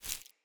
Grunt Foley Step; Enemy; Characters; Corrupted Sproutfolk

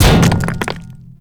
Gatling Gun Debris Impact; Gameplay; Warframe; Tau

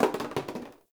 Janitor Cart Plastic Bottle Drop; Warframe; Ambience; NineteenNinetyNine

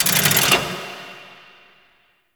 Portal Activation; Tau; Proto Frame

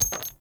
Flak Cannon Shell Impact; Warframe; Weapons; UT Weapons

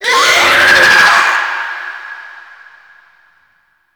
Infested Scare Vocal; Gameplay; Warframe; Corpus Infested

